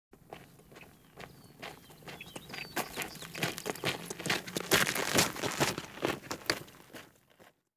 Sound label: footsteps on a grass; Grass and Dirt; Butterfinger Video Sound Effects